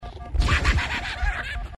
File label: evil grunt laugh; grunts